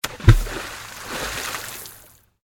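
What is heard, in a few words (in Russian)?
всплеск воды сильный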